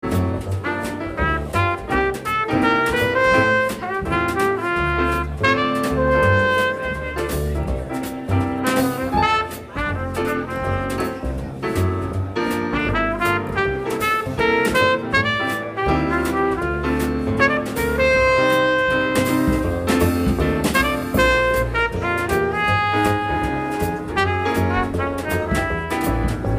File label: Jazz